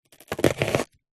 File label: food chop onion with knife single slice